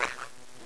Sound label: Goblin Attack